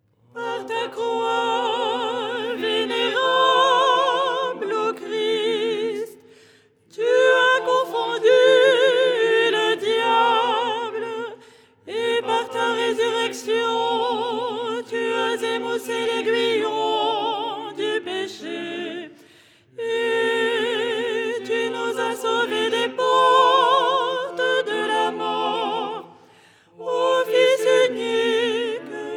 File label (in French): soprano; Au lucernaire, 1ère stichère du dimanche; Les 8 tons en français